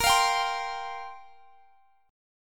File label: F Suspended 2nd Suspended 4th Bb